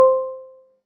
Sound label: ping; buzz